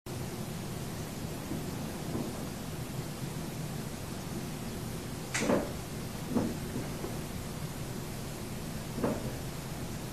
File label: footsteps two